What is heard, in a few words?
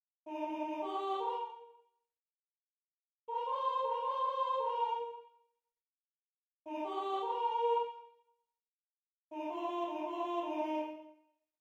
sopranos; bars 53 - 61; fairy tale creatures; dragon